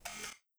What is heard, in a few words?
camera zoom